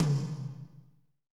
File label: tom, lite jazz, Roland